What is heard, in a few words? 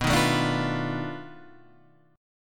B 7th Sharp 9th Flat 5th, guitar; B7#9b5 Chord {7 6 7 7 6 7} strummed